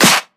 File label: Claps & Snares